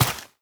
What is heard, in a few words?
step, footsteps, player, mud